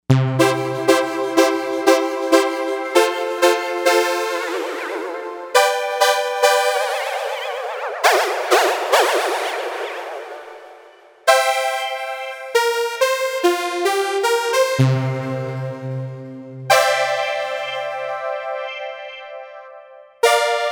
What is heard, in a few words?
Wavetable Stab; Novation UltraNova; Factory Patches